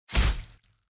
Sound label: puncture